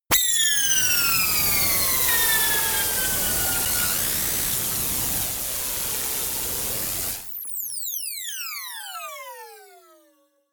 noise jet fall

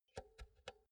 insert cinch slow

